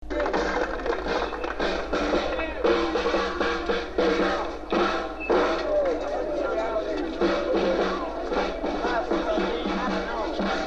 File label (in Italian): Tamburo della banda